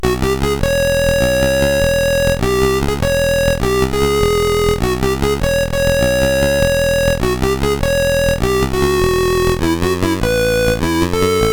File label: Boogie, CSG8580R5